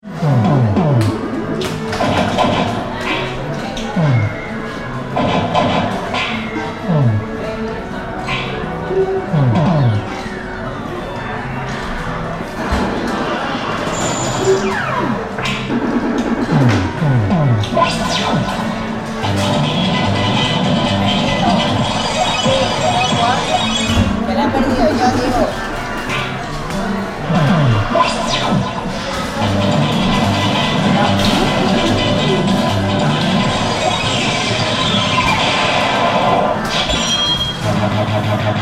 Amusement Arcade Atmosphere 1 - gaming machines background; Arcades; Tom Hutchings